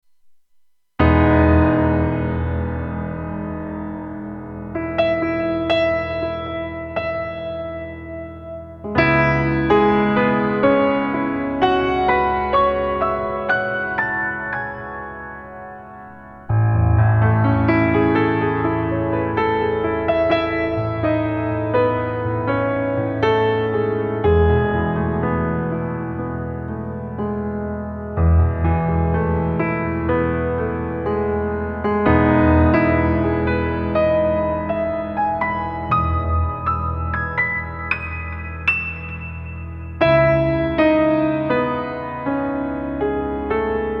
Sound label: Keyboard Sounds; Rodrigo RS Keyboard Sounds